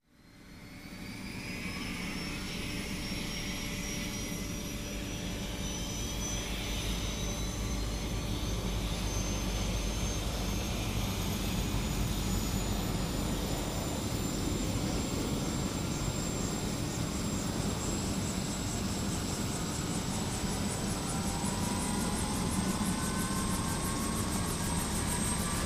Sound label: UH-1N Huey start